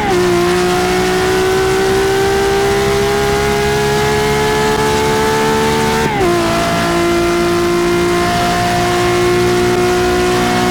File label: fourth cruise, vehicles